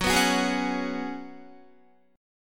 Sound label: Gb Suspended 2nd Suspended 4th, Guitar; strummed